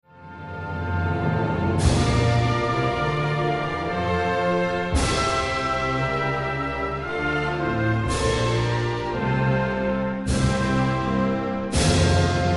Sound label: win jingle; Austria